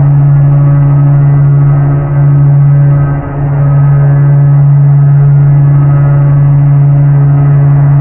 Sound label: Projectile Loading